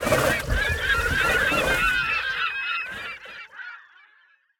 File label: birds takeoff